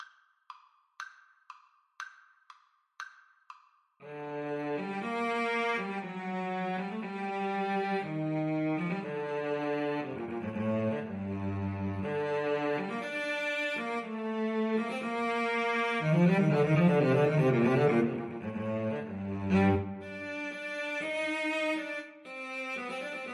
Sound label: Cello Duet